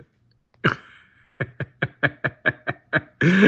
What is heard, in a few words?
evil laugh